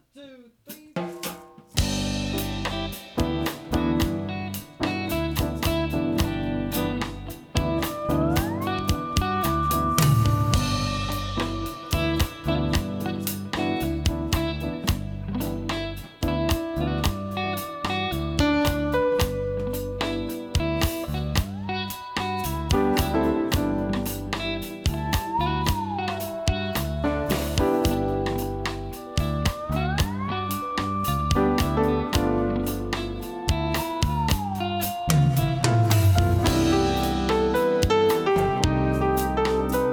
Bongo; Bongo #1 (Unused)

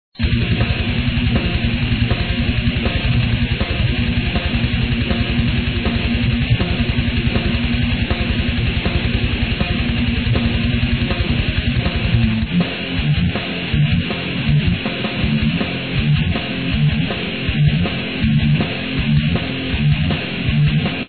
Fight Music; The Island; Territory War Online

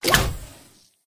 Caveman Bear Trap Snap; Doodle Jump 2

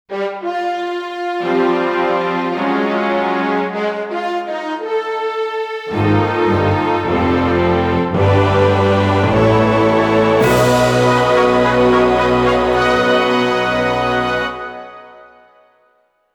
Clear Fanfare; Diababa

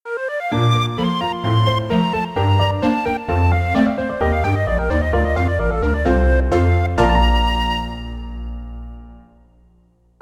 Level Win; Diamond Drop 2

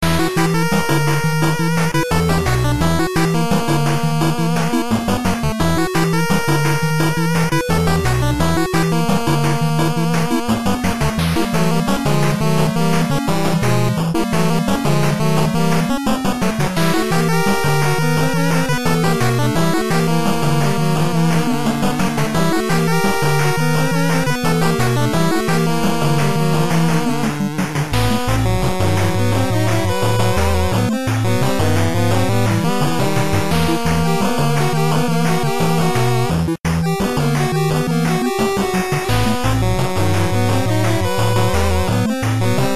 Battle; Slime Star Asobox; android game music

